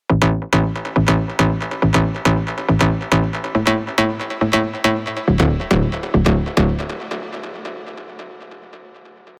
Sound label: Grinder, rolling bass; Revealed Serum 2 Rolling Bass Vol. 2